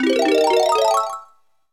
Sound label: Morph, Sound effect; SMG